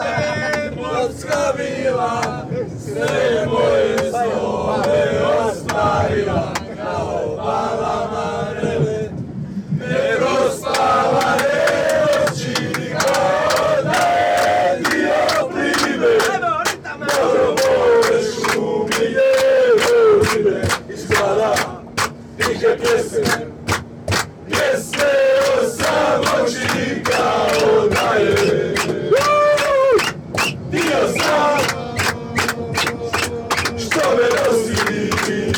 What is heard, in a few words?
clapping, croatia fanchants